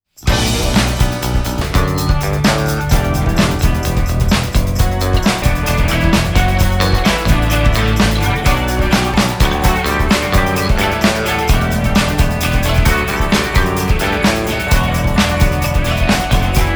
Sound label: Instrumental